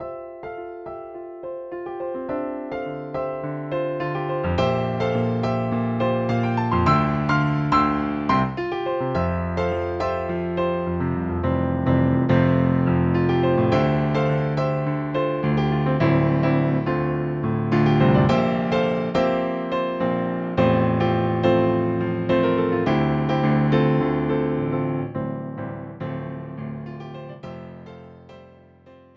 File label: Grand Piano